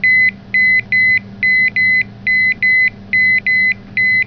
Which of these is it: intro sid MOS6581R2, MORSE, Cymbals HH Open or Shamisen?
MORSE